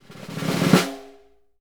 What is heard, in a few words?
SNR WR CRS, Synclavier Percussion; ILIO